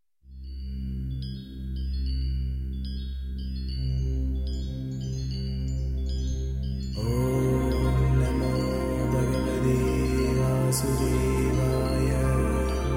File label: String Interlude